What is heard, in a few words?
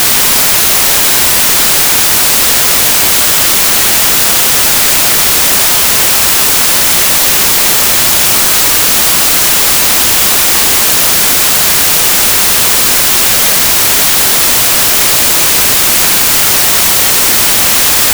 White Noise; headphonebreakin